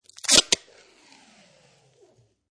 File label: Tape Hard Rip From Tape Dispenser; Pull tape off tape dispenser, hard ripping sound.; Offices